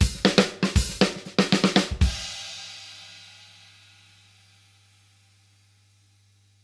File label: Fills 120; Zero-G; Monster Beats